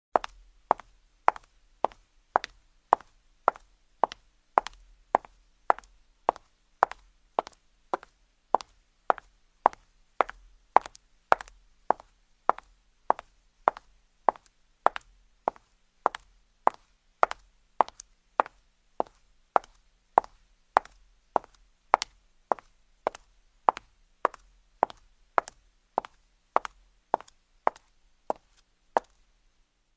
Footsteps Sound Effect - Heels Walk; human; shoes; high heels

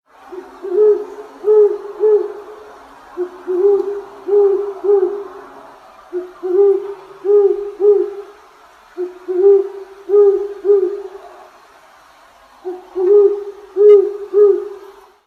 Great Horned Owl Call Sound

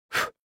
Blow Sound Human Blow Candle

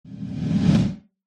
Zoom In And Out - Sound Effect

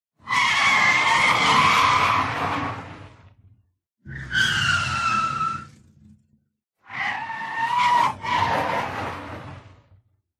car brake

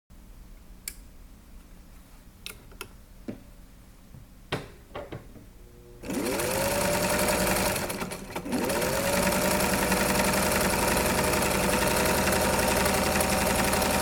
Sewing Machine Sound

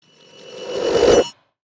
Tiny Riser

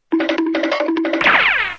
sound effect Running away

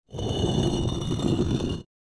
Stone Sound

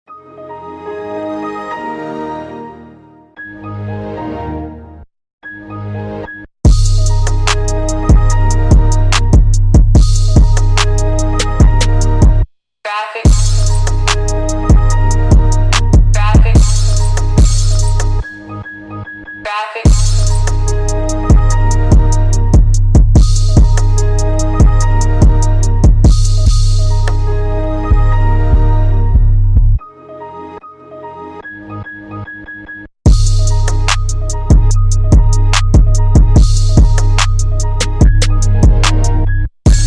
Windows Song Trap Remix

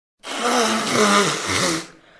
Sniffing

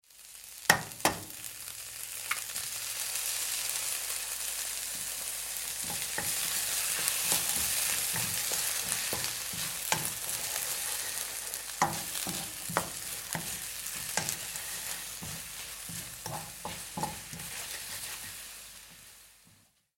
Egg cooking or frying in pan sound; kitchen

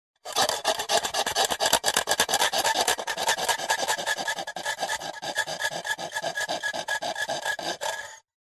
Hand Saw

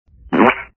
fart; صدای گوز برای کلیپ اینستا; insta; human

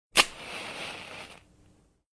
match lighting on

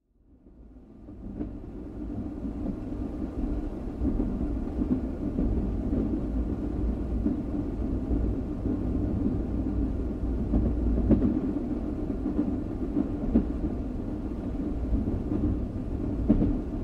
train on tracks moving

